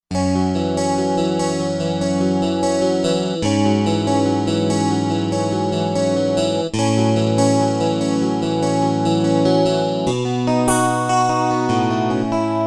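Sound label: Guitar Strat 4 Way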